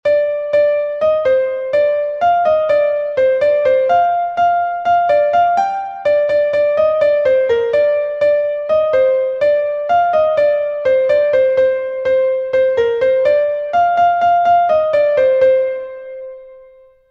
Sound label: Piano